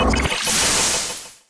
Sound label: magical attack